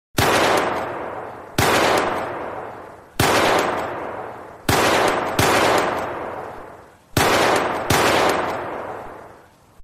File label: Realistic 9MM Gun Sound Effect